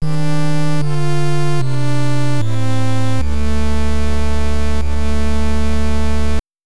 Fanfare (Famicom Disk System ver.) [Stereo]

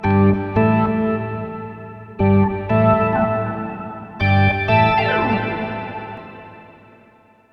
Ditty

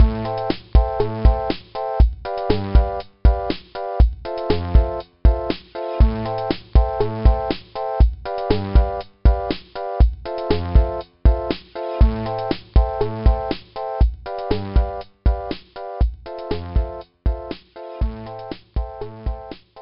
Music Pacman Bonus; animal world big cats dinosaurs 2009 nds gamerip

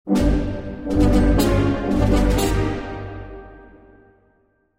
Level Start Lightside; Angry Birds Star Wars 2

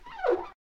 Cleaning Window

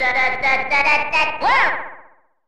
Collect Paper (Echo); The Simpsons Bart's Nightmare; SNES

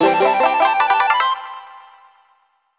Collect Extra Honeycomb Piece

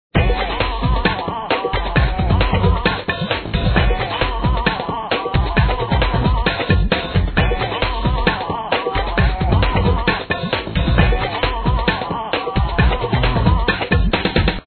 Crunked Up Loop; SpongeBob SquarePants Reef Rumble

